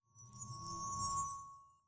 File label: Poison Dart